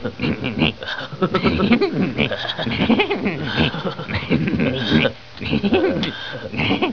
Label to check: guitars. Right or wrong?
Wrong